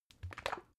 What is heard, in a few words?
bottle syrup upend; berufe; gastronomie